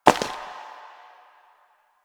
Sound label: Step Ice; Warframe; Koumei